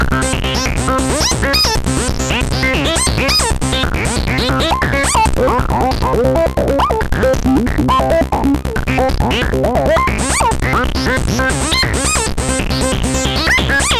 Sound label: dance seq